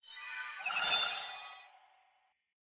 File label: large Win Flash; cashomatic